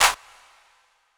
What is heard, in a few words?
Snare Hit; Hip Hop Exotica; Apple Loops; 086 Dmin